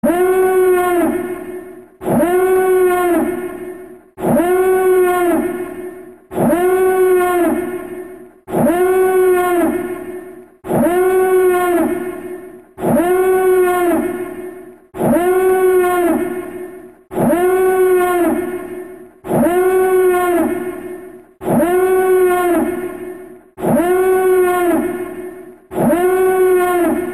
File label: Imperial Alert